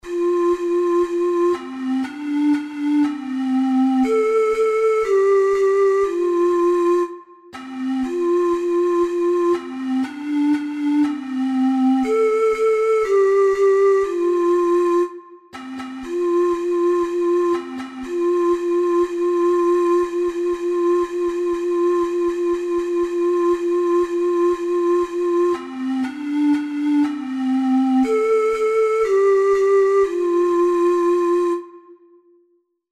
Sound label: old macdonald, ocarina four hole